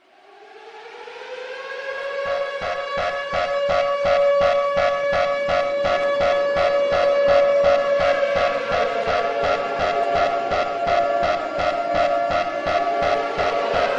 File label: alarm; nuke